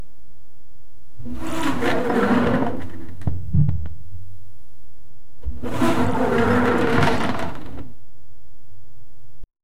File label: sliding down the inside o, playground